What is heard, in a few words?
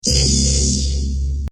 gem countdown; popcap; bejeweled